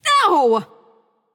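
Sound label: ouch, female; smite; voicepack; hera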